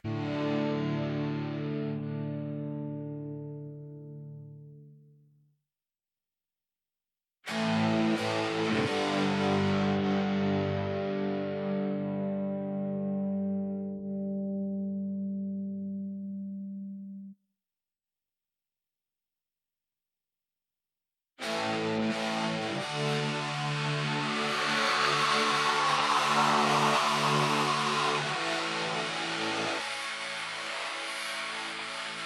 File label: Guitar